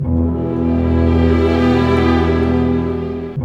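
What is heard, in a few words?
60 dark, orchestra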